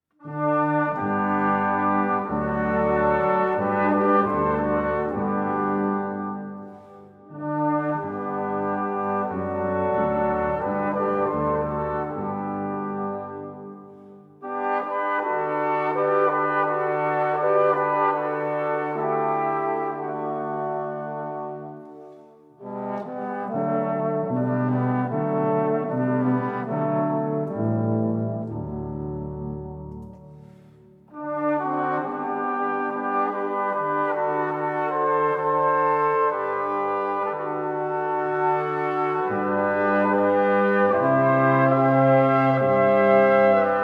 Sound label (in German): O freudenreiche nacht Blaser